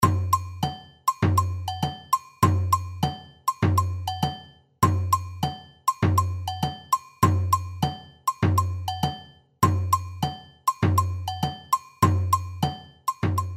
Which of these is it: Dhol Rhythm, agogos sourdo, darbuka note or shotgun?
agogos sourdo